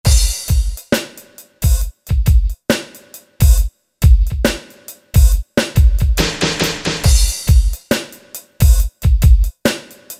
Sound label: HIP HOP, Factory Rhythm Sets, Roland SC-880